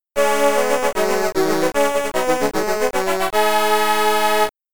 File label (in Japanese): 予選スタート・ファンファーレ